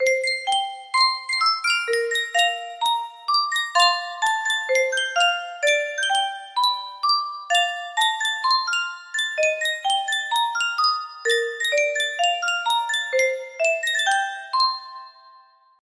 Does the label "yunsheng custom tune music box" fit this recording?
Yes